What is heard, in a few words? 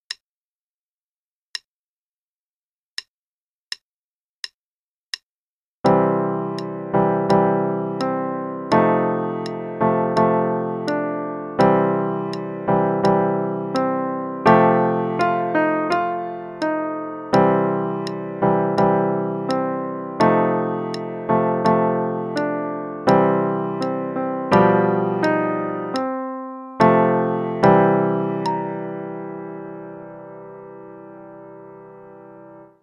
Chord Blues, qn=84; Level 2B - 2nd Ed; Lazy